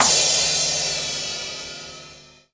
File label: E-Mu Proteus FX Wacky Crash Cymbal